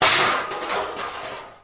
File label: hit, material metal